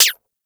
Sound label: Rifle Mech; Warframe; Weapons; Wisp Rifle